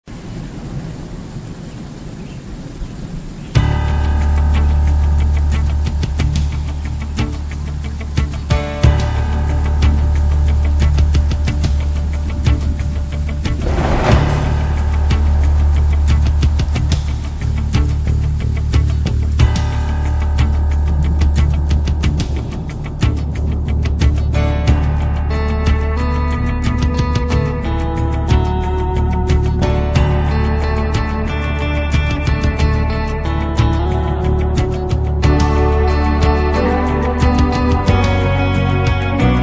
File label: Guitar Instrumental; Instrumentais